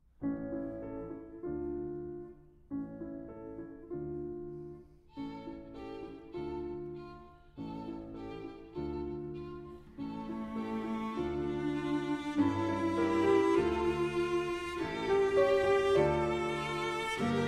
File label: Piano Trio in E Minor Andante moderato Allegretto; DVORAK; Dumky